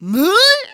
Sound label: piglet fear; Bad Piggies